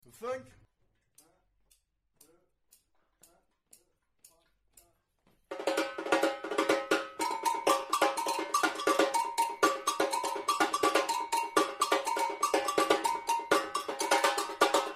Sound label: Samba Funk